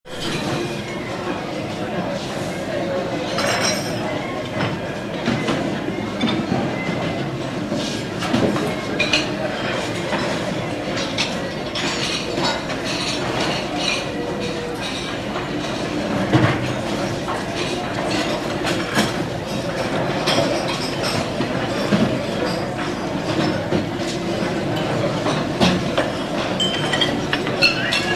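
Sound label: Restaurant Atmosphere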